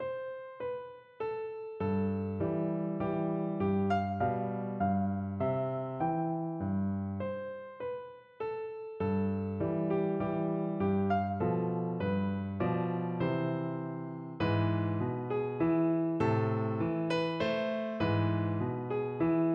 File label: PNO